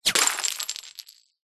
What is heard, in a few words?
bullet whizz hit glass bottle smash